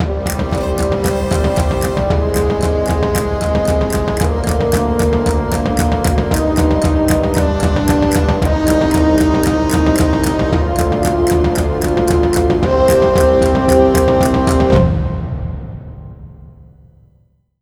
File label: MUSIC WIN; Survivor 3D The Ultimate Adventure; 3DS